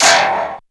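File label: METAL, FX percussion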